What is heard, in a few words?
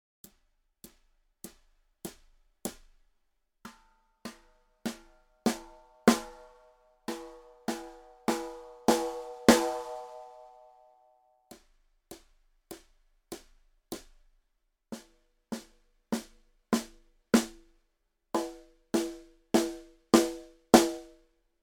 Snare Drum - Birch Rutes